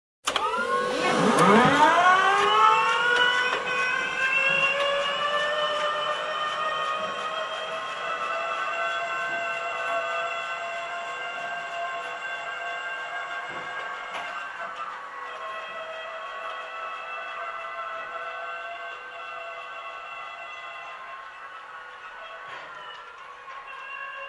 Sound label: Hydraulic Machine turned on and off up-close